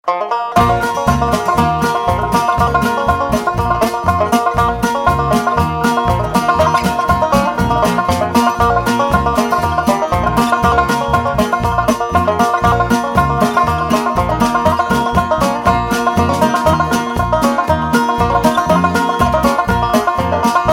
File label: Banjo